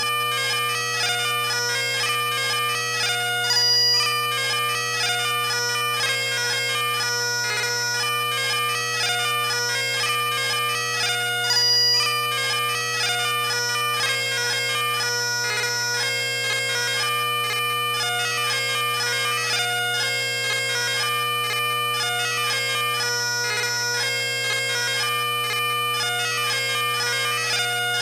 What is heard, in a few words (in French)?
Gavotte de l'Aven 4 4